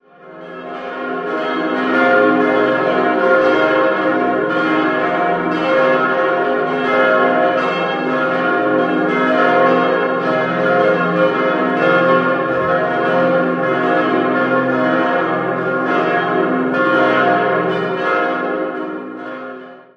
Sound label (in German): Poecking, Glocken